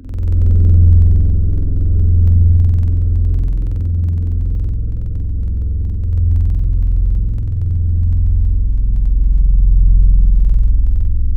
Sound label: One Shot - LD Clouds; Wavetables; Synth One Shots; Synthetic's Serum Collection; ESW Core Wavetables; Echo Sound Works Core Tables